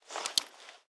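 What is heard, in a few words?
Weapon knife switchblade unequip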